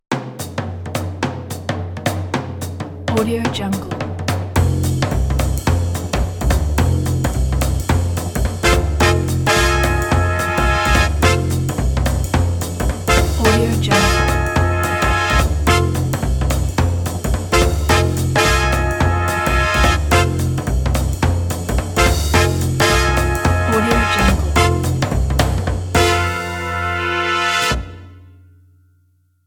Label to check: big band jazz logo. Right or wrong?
Right